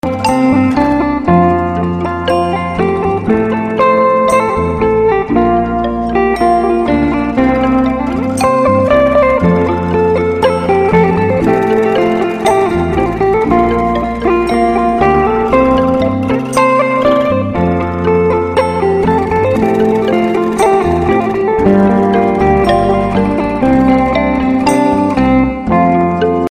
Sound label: Sape